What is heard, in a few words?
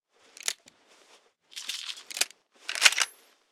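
Weapon rifle reload; riflelincolns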